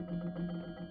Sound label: Loops; Yamaha DTXpress-II